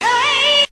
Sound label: "hey" SUNG; Voice; ooh ahh